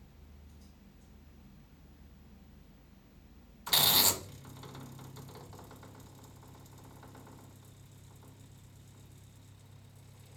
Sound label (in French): bruit anormal vanne 3 voies chaudière condensation